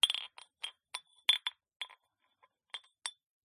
china cup clink handling set down pick up on table jingle; PMSFX